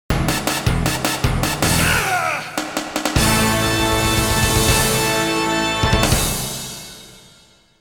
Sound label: Stage Clear (Short), PC Engine CD; Cho Aniki